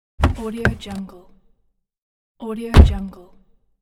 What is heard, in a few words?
car door inside